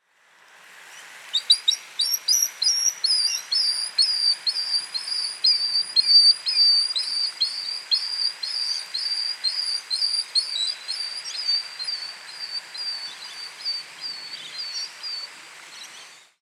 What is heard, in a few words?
Beach Stone curlew Esacus magnirostris, beach thick knee call